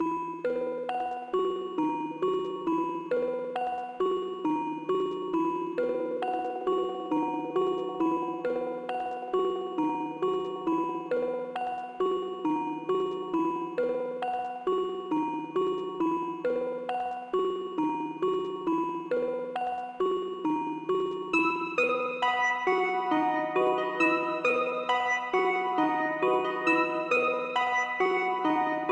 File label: Bells; Anger Music Library